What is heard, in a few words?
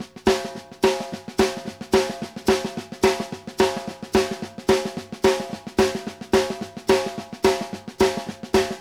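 hands, train, drums; Sampleheads; New York City Drumworks VOL-1; SP TRAIN 108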